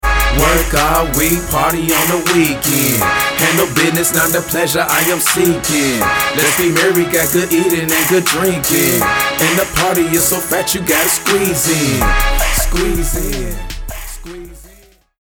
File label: HIP HOP